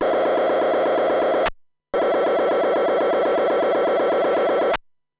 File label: nfm